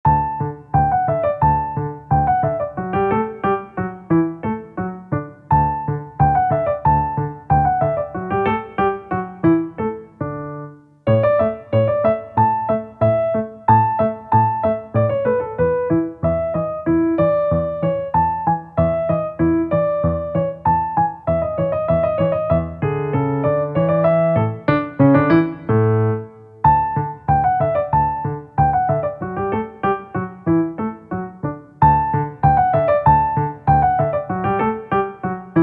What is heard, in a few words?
Mussette in D Major, piano; BWV Anh. 126; Bach; piezas faciles piano